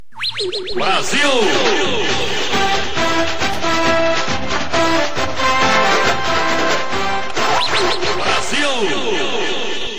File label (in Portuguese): vinheta gol; do brasil globo